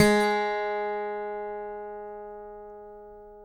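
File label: acoustic guitar pick G#3; Country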